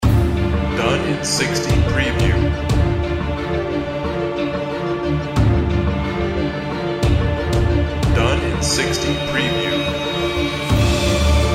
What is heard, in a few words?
Action Movie Music Score